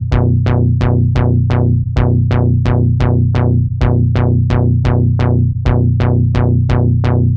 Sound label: Bass Loop 130 B, Techno; Ghosthack; Free Techno Sounds 2024